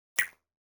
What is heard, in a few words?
Button Spring Small Springy Button Beep; Hollywood Edge; sound designer's toolkit v2